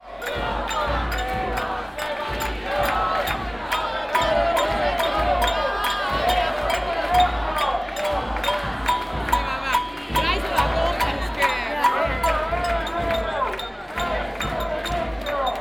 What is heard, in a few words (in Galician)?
Praterias